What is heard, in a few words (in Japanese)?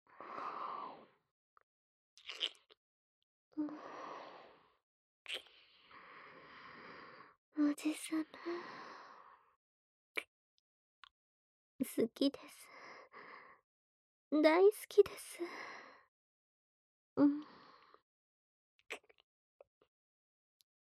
沢山キスしましょう♪（甘キスループ）; ASMR; 叔父様♪; 耳塞ぎなし